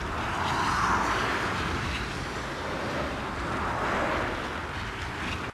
Infernal heat Whirlwind Loop; Hero Murglun

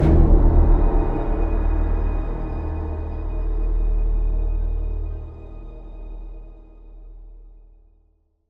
Combat Low Threat End Stinger

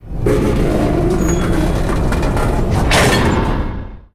Ruins Temple Ramp

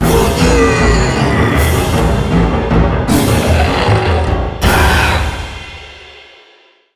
Horn; Army Corps of Hell; PS Vita gamerip 2011

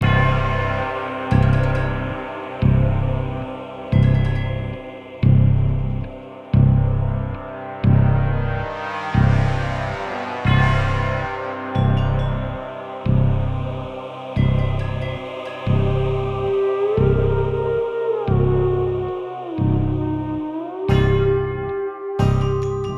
Haunted Puzzle Loop; Haunted Expansion

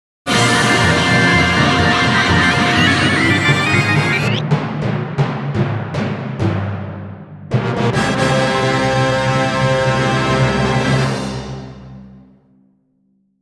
Success Jingle; Splatoon 3; Splatune 3; Salmon Run Success Jingle No. 4 (After King)

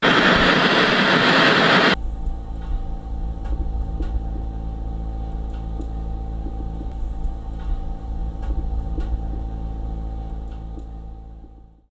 Glitch Start; Five Nights at Candy's

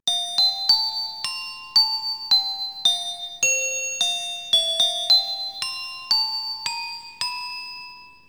interaction music box loop

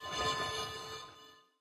Crystal charge Loop End; Frog Infuser

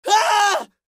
Screams Male; Voices

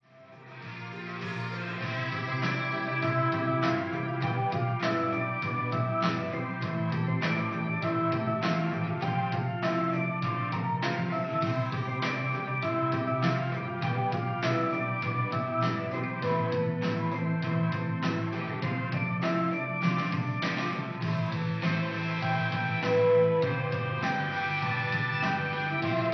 Rock

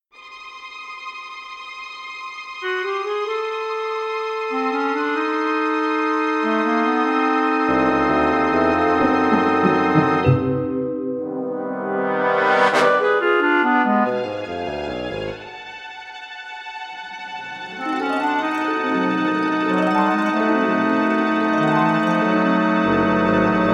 Eerie

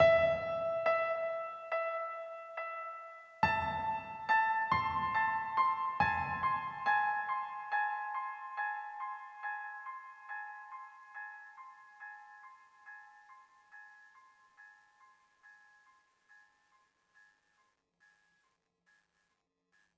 One shot Piano; zombie army 4 dead war ps4 stadia switch windows xbox one gamerip 2020

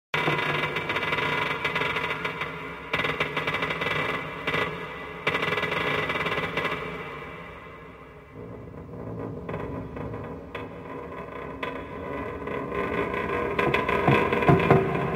SFX Amb Belowdeck

